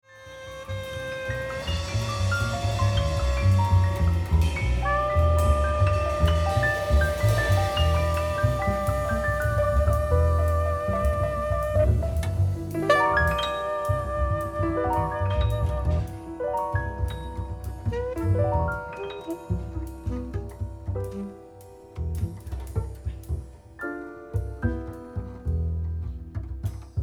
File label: Tango